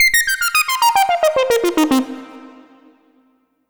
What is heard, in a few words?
lead (brass)